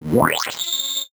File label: VocoBit Noise, 8-bit; musicradar; 8-bit bonanza